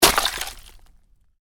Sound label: Ice impact medium, Ice: medium impact with debris; Snow Sound Effects; Snow (Sound Effects:Weather); Stuart Duffield